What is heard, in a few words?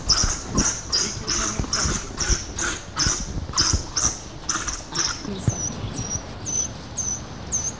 Lycaon pictus twitter; African hunting dog; The high-frequency twitter and whistle of a female dog